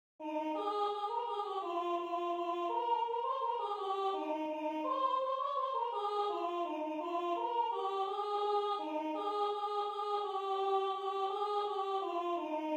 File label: Soprano